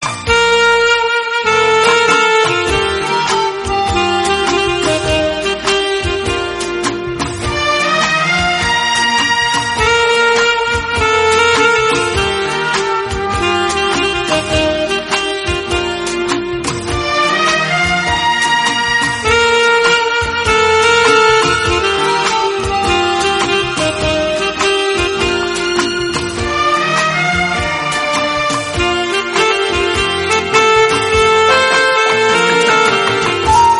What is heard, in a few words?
Romantic Bgm